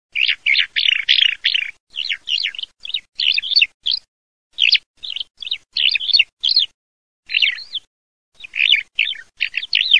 ALLODOLA

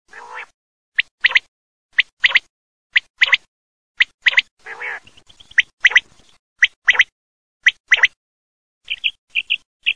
QUAGLIA

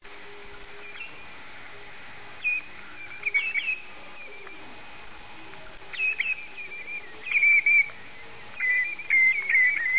COMBATTENTE

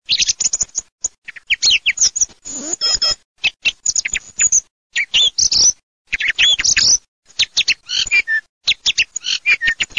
tordo, birdsound

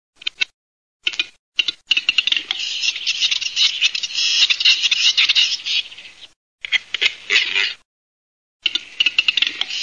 GALLO CEDRONE, birdsound

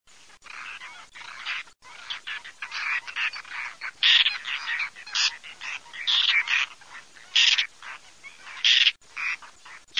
MIGNATTINO PIOMBATO, birdsound

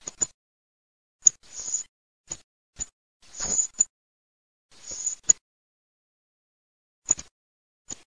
TORDO ZIP ZIRLO

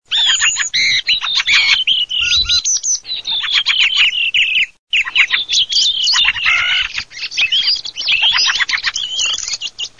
TORD, birdsound

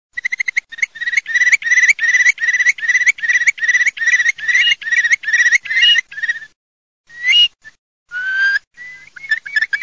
CHIURLO

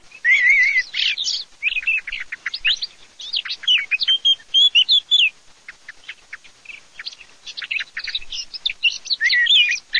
capinera merlo, birdsound

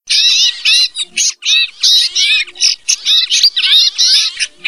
peppole schiamazzo

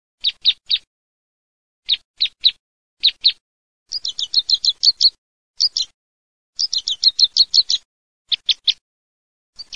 CROCERE

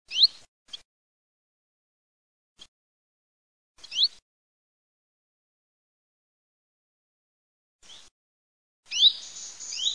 LUI PICCOLO, birdsound

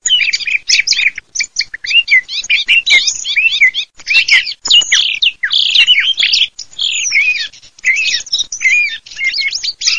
TORDI MIX

